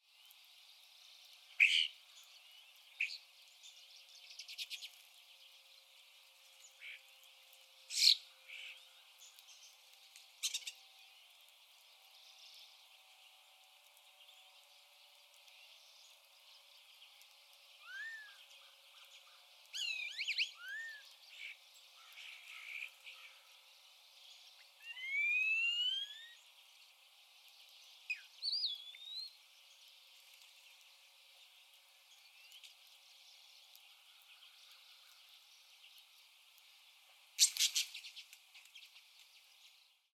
European Starling; 40 seconds

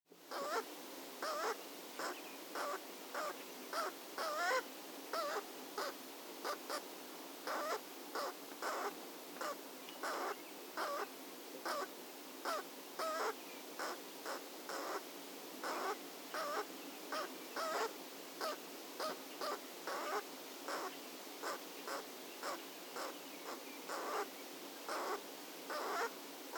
Pileated Woodpecker fledgling

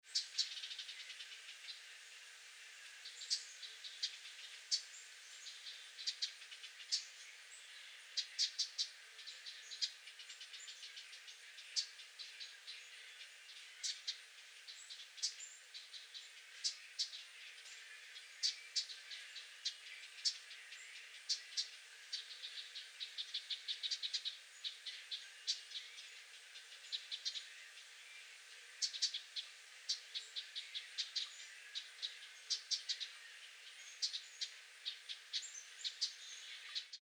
Fledgling Wren Making Call

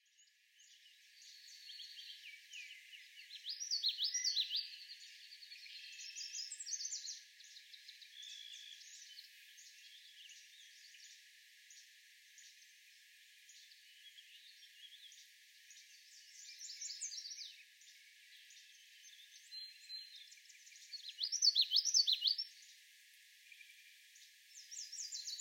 Common Yellowthroat songs

